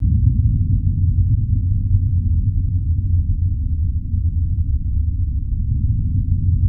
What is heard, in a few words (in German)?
MASCHI; Best Service; Advanced Mega Effects